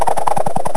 spin

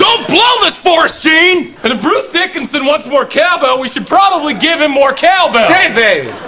cowbell

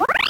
code lock update

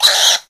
tushkano pain

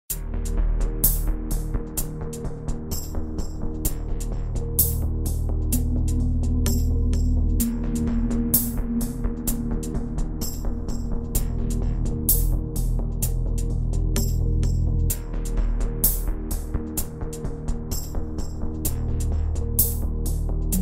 stealth loop

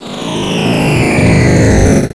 laser; vendetta; robocop

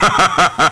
hahahaha

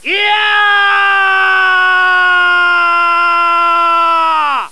iyaaa

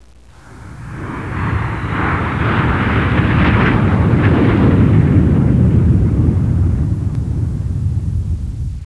jet fly by; ambience

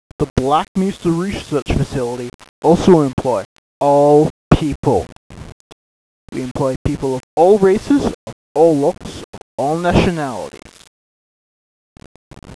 tv

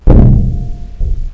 player swim

